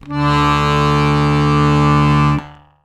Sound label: BASS D 1; Best Service; World Colours